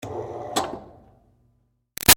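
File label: Projector Screen Close Up Down Short; Offices; Big Room Sound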